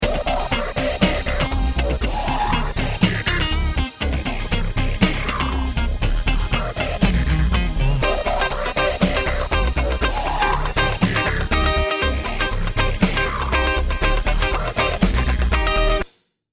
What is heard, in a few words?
backing track example